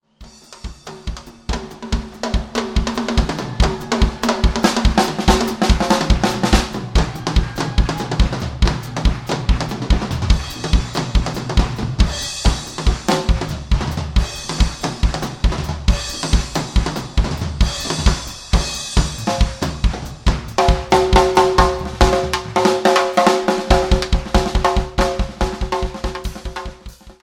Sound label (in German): Schlagzeugsolo